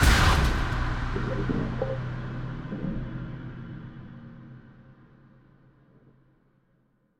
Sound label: shock instant; Joe's Diner